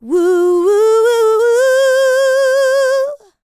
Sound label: LICKS, Vocal Planet, R&B; ILIO; Dance; LT R+B